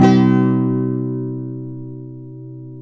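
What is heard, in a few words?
nylon guitar down major C#, flam chords; Spectrasonics; Hans Zimmer Guitars VOL-2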